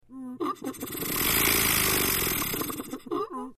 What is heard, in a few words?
Motor Pump Small, pumps